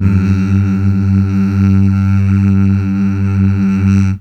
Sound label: Mmh Male, CH BACK